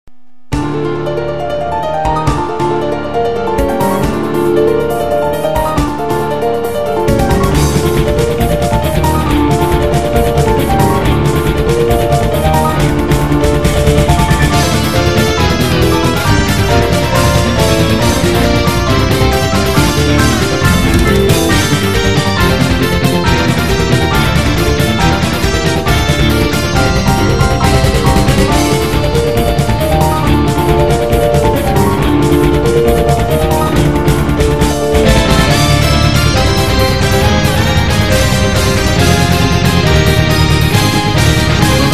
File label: battle